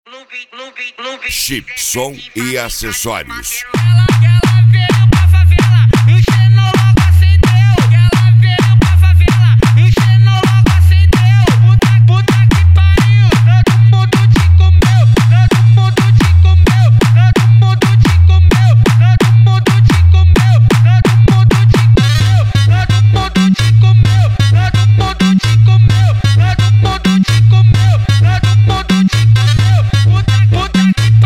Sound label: funk bass